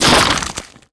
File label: corpse explode